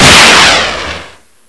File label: rpg7 fire, weapons